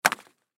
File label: Lacrosse Forearm, Lacrosse Forearm Pad Drop Cement; Equipment; Sports; Blastwave